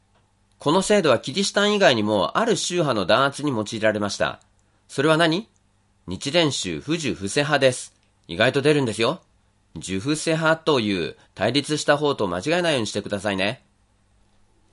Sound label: human